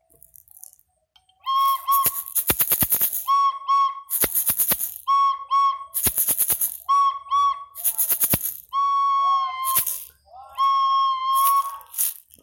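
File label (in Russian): звук глухой свистка болельщика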